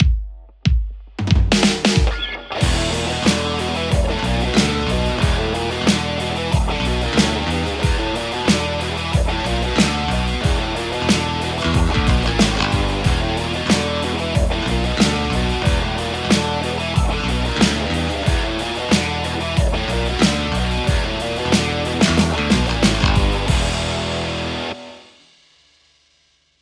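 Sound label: Telecaster; overloud th3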